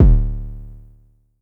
Low Kicks D1; LIMAT Div Dept; Drums

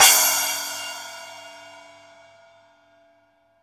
SPLASH, Drum; East Collexion; Drum 1 Dry

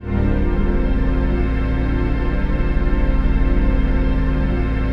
TRANCPAD; Best Service; XX Large Pads; Sample CDs

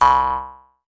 Jaw Harp Open; Roland BK-5; Percussion

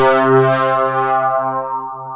ROLAND MKS-80; YOU FAT; Rubber Chicken Software Sample Bank

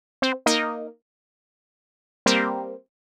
125 stab E, Keys, Nu Jazz House; PowerFX - Nu Jazz House

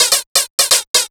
127 DRM, Fresh Disco House; USB Soundscan vol.20; AKAI

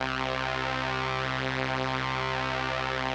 505, String Machines

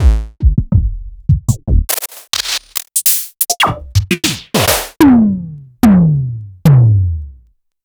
Korg Prophecy

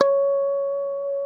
C#5 FUNK, STRAT, Guitars; Spectrasonics; Hans Zimmer Guitars VOL-1

